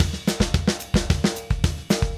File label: Drums, Jazz